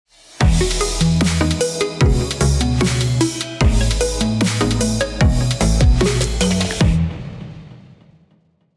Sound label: Break Beat Pop 150 BPM; Marcello Del Monaco; Podcast Hub